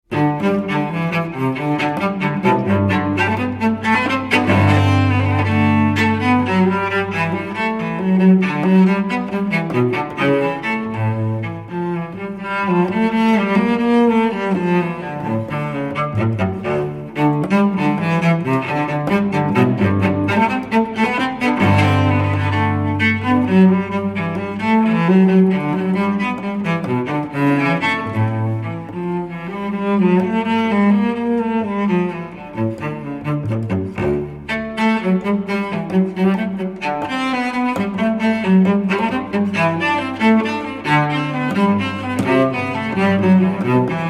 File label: Bach Cello Suite No. 1 G Major Gigue